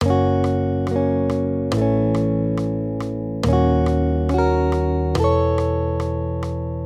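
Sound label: Guitar, Accompaniment, Major Triads, Gb-A, 140 BPM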